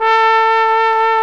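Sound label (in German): posaune bass A3, trombone; Best Service; Alpine Volksmusik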